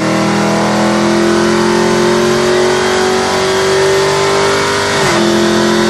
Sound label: fourth cruise, vehicles; dodge ram 1500 outdoorsman